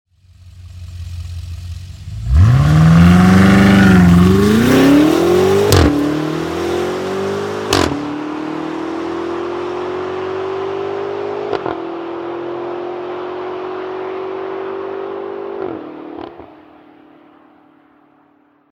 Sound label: BMW M V8 Start with Launch Control; x5m; 2014